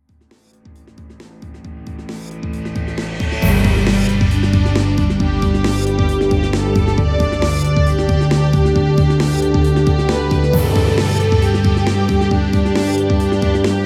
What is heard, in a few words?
Brave Pilots (Menu Screen), space shooter music